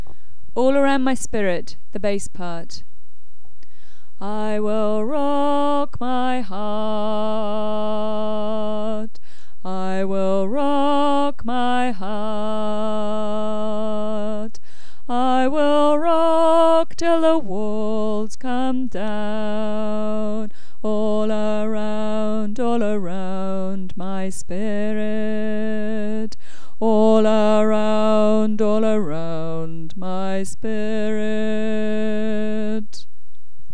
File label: All around my spirit bass